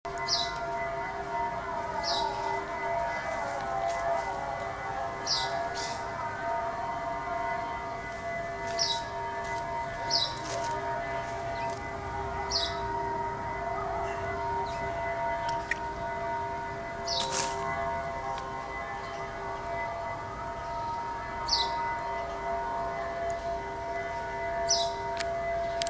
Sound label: mosque error